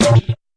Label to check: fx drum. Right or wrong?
Right